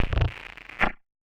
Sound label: Casio, Glitched, malfunctioning synth